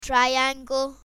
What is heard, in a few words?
triangle; 2D SHAPES